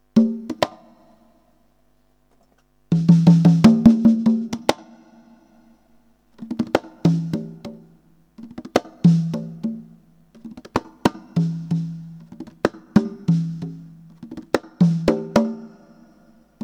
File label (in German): conga kurz